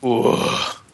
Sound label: guuuuh